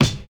silky steel kick drum sound f sharp key, Royality free kick drum sound tuned to the F# note